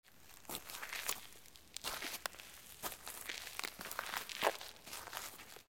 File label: Cave Steps